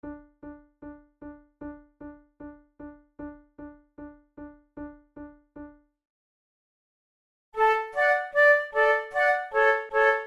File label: boieldieu the white lady trio, flute